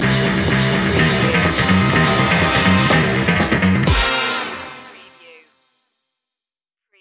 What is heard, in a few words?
retro quest stinger